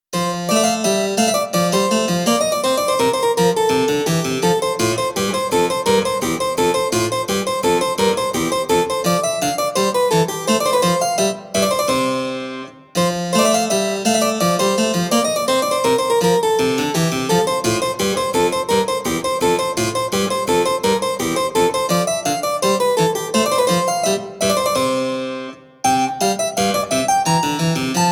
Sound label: Menuet Harpsichord